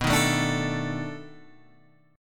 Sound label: B 7th Sharp 9th, down, Guitar, Open G